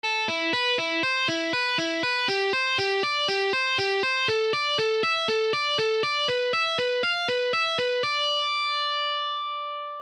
inside picking, alternate picking exercises

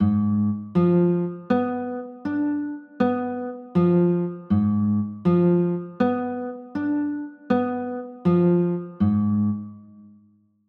a flat dim7

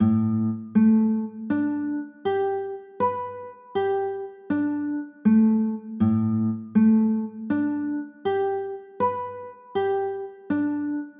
a 11, chords; pos 3

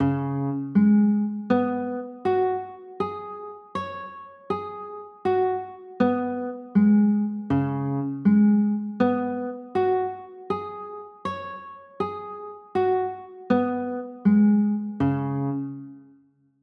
c sharp 7sus4, chords; pos 3

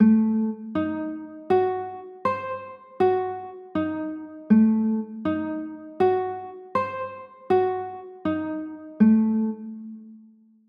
f sharp dim, chords

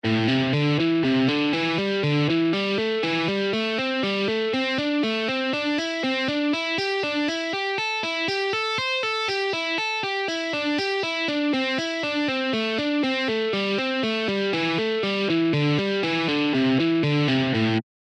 pentatonic, alternate picking exercises

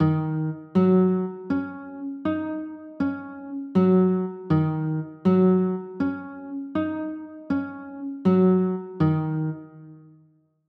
e flat m7, chords

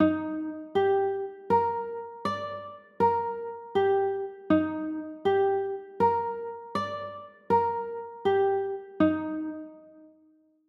d sharp maj7, chords

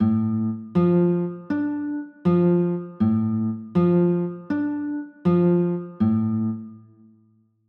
d m, chords

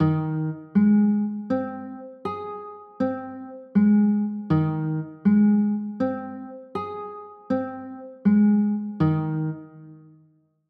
g sharp maj; chords

